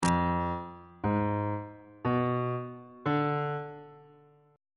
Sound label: g flat harmonic minor 7th chord 7 is f diminished 7th on bass clef